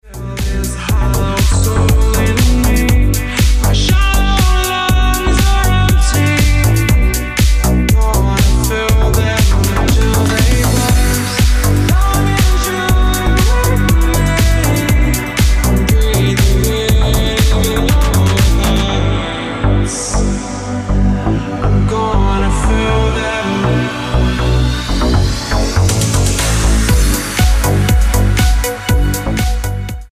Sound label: Original Mix Ringtone